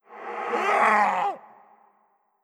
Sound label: Skell Scream; Necro